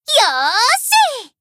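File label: attack; asuna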